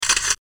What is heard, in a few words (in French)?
appareil photo